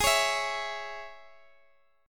G sharp Suspended 4th Sharp 5th Guitar Standard chord, down; strummed